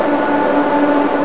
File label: Blower